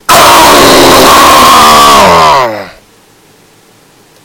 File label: jumpscare sound; FNAF